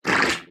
wriggler attack, enemies; Factorio

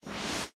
spawner respirator pull; Factorio

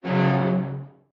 recycler jaw move; Factorio

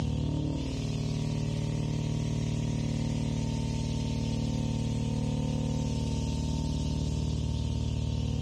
thruster mechanic; platform thruster; Factorio

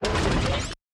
rocket turret activate; Factorio

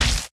rock inventory pickup; Factorio

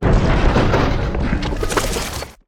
space platform tile build, factorio sound terrain

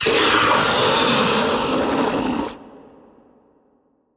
demolisher roar, enemies; Factorio

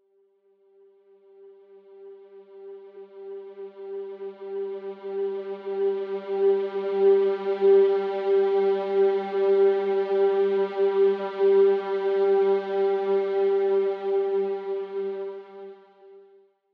ambient, vulcanus

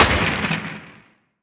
asteroid damage oxide medium; Factorio

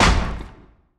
asteroid damage metallic medium; Factorio

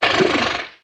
stomper idle, enemies; Factorio

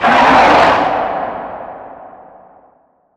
demolisher death, enemies; Factorio

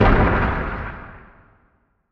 asteroid damage interstellar big; Factorio

